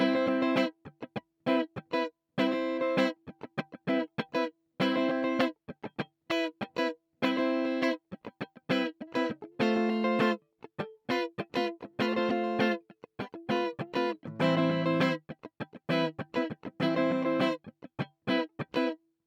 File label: Guitar Rythm Highpass 150Hz